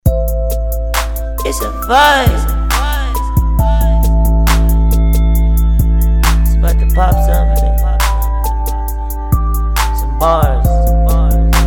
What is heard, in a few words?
Pop